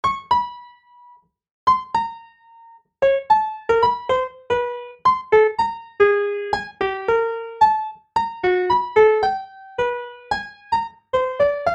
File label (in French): Adaptatio de la trompette, melodie 2 voies octaviante 100 cents 111 bpm; l'insensée à 2 voies avec l'échelle octaviante classique (à 111 bpm)